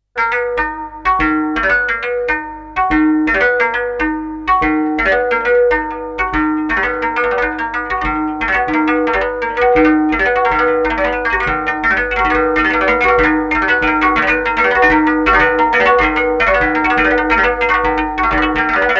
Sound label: koto type istruments